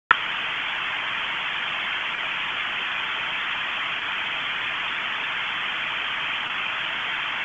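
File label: EMS ALERT, radio; wake; p25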